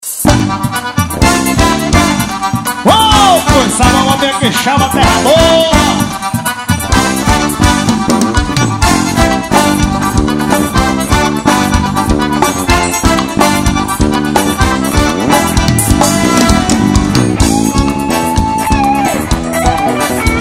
acordeon banda; quixaba pe

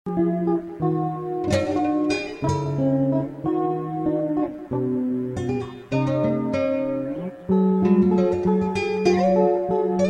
dueto em solo

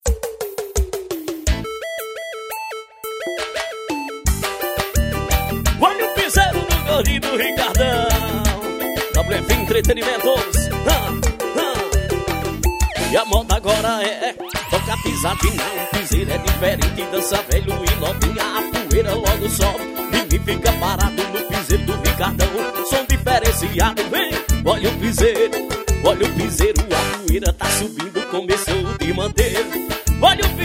piseiro

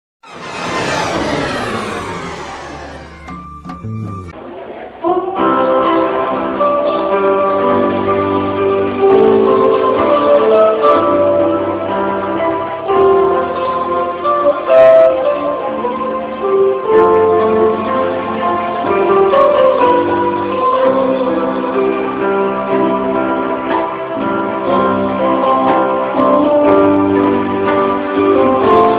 instrumental

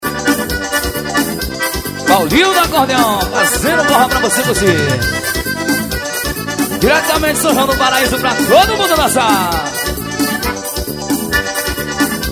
acordeon solado

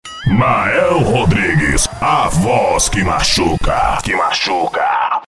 vinheta abertura

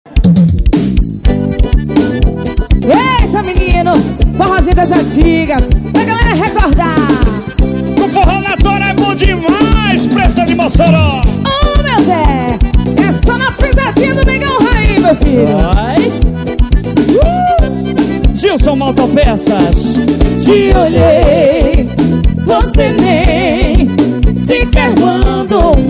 forro das antigas

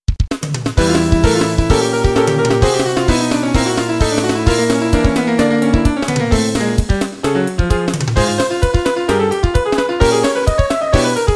violao

